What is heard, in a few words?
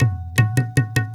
INDIA R, Heart of Asia, IND ENS